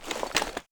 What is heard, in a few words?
m14 draw, weapons